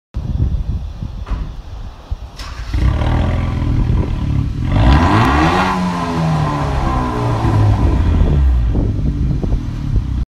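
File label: Forte Koup, اگزوز; سراتو کوپه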